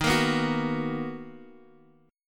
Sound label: B Major 7th Eb